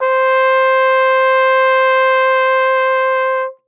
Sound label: flugel C